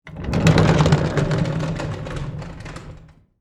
Industry, Cart, Roll, By, Wood, Surface; Rolling; BlastWave

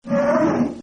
MONSTER, Monster Snort; Monster Sounds; Horror; Partners In Rhyme

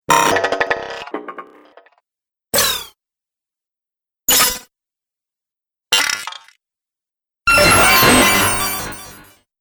Droid Variety, robot; Variety Sound Pack 03; Robot Sound Effects

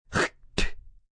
Spitting/Horking, hork and spit (human voice); Sound Effects:Human; Voice Box

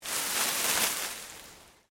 Leaves Move Medium; Material components for constructing more complex sounds; Rustling; Objects

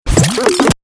Turntable Scratching Reverse; DJ; Sound Effects:Musical

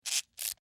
Servo Mechanical SciFi Robot Toy; Scifi Servo F Mechanical Robot Small Motor Toy; BBFX

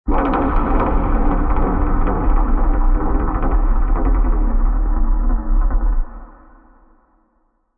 Scry Rckus, Creepy crazy dark sound.; Scary Tones; Horror; Scary Ruckus

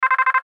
Radio Morse Code; Morse Code Number Five; Morse Code Sound Effects; Blastwave; Warfare

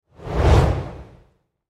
Fireball Burst, Fire Burst Flame Blast; Flames; Sound Design; Flame Sound Effects

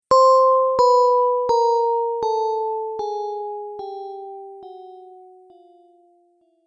Tap Down Delay, tapping; object sound effects

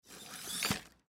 Sci Fi Servo Motor Small Robot; Servo Motor Small Robot Hit Object

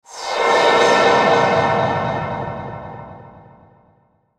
Whoosh Big Slow Fly By Delay; Part of Whooshes; Blastwave; Whoosh Sound Effects

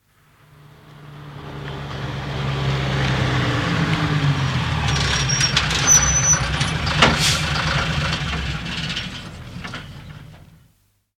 Exterior, approach and stop, 2 ton diesel truck; 2 ton diesel truck, make not known; BBC

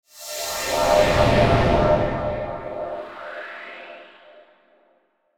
Whoosh Sweeper Airy Swirl; Blastwave

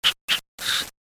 Pat Pat Psst; cartoon; Miscellaneous Cartoon Sound Effects

